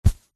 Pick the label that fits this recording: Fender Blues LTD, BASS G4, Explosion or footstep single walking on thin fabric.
footstep single walking on thin fabric